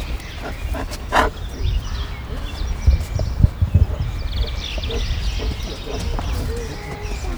lihegés, farkas; állathangok; nyíregyházi zoo 2010

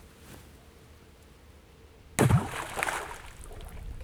egy töltés kavics repül, vízbe kődobálás; vizvar; cardioid

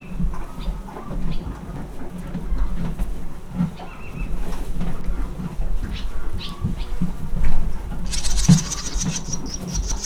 ló eszik; parasztudvar

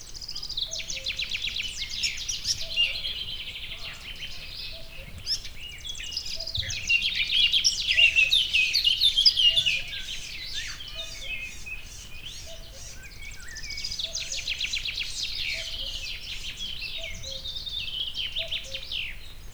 erdei pinty barátposzáta fekete rigó; termeszetben

